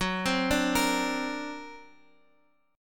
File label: D Augmented 7th Gb, guitar